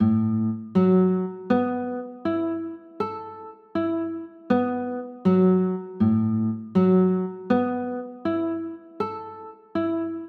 a 6 9, chords

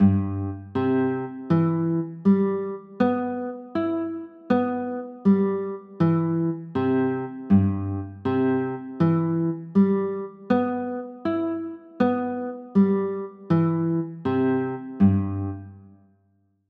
c maj7

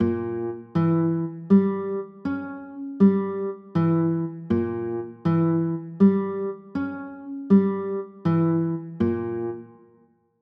g dim, chords; g dim7

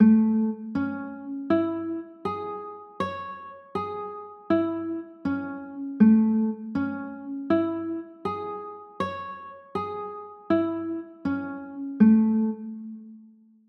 a maj7, chords

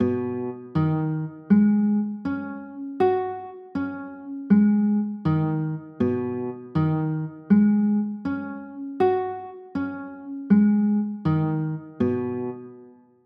b 6 9, chords

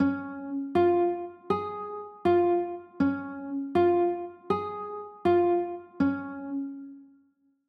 d flat maj, chords

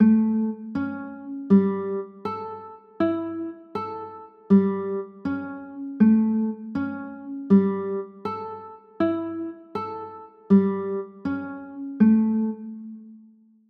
a 6, chords

